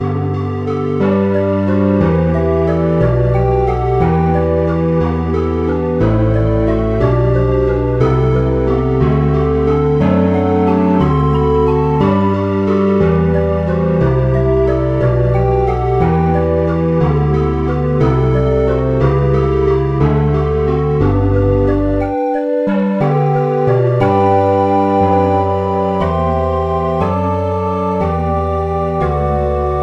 ocarina

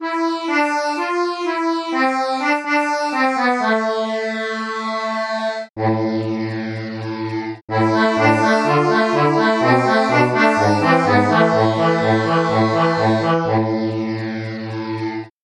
accordian

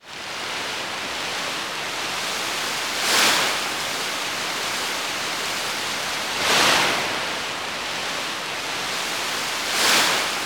sea shore

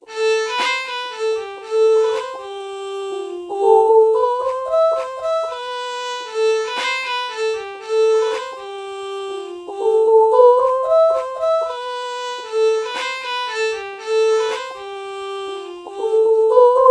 oh violin melody morph

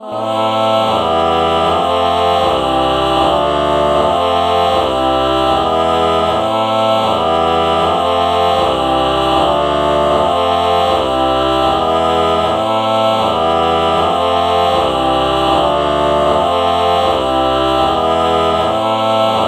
ah ah chord morph

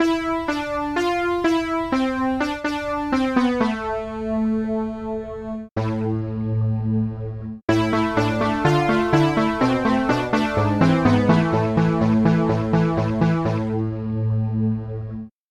synth brass

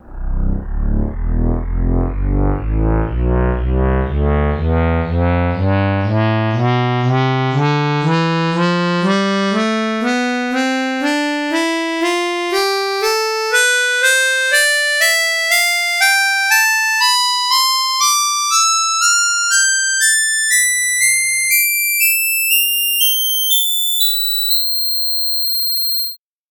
harmonica tonleiter

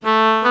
alto saxophone oboe melody morph low

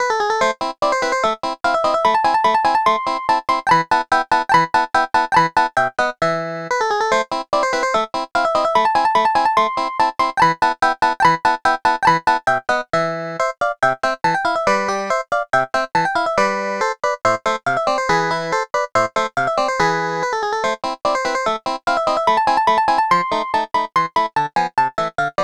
clavinet

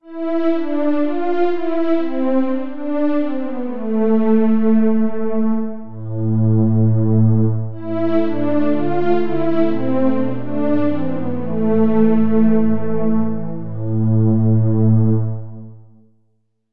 warm pad

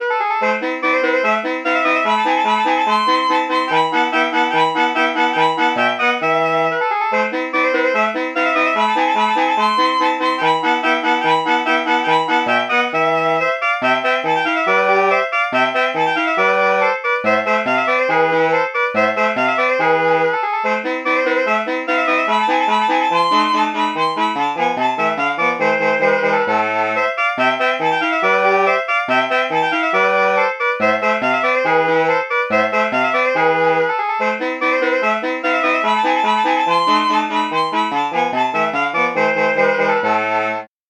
alto sax

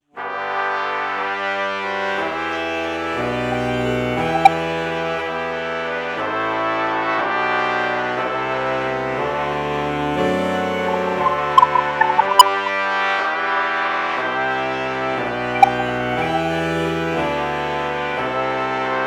alto saxophone trumpet morph

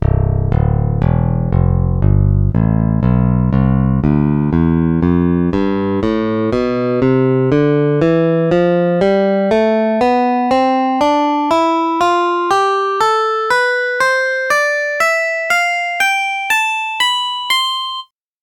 picked bass; tonleiter

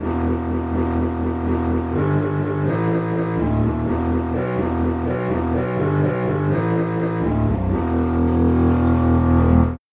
cello bass

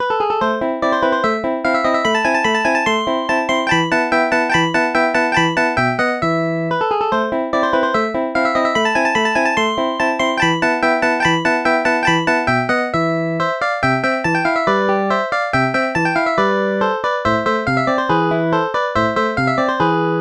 fingered bass

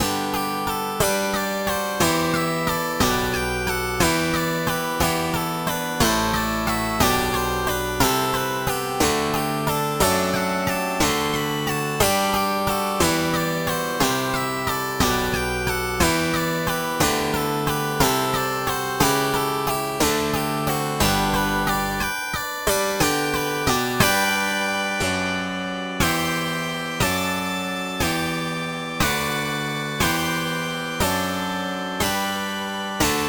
pop bass

